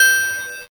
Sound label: ding